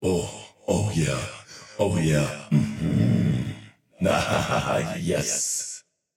yay; chaac

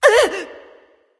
surprised; smite; nike

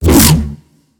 swing small; smite; god arthur

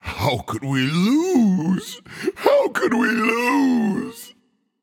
boohoo; Smite; atlas

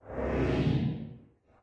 swish; smite; god thanatos

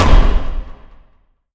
airdisc impact; smite; kukulkan

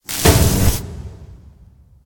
Activate; smite; raijin

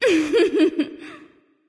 heh heh; smite; awilix

